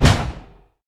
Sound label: druid henge rock throw